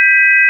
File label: sine; 音の波形と分析